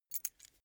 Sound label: key ring small put down